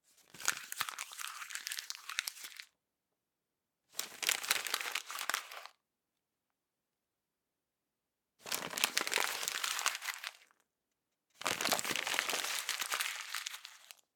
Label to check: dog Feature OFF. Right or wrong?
Wrong